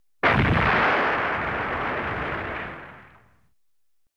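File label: bomb and grenade sounds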